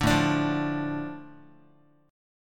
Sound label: A Suspended 4th Sharp 5th down Guitar; Asus4#5; strummed; 5,5,5,3,x,x; 4A,4E,5A,5D,5G#,6C#; JGuitar